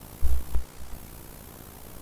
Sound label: vhs static